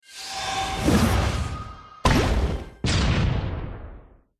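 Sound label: attack; hero sound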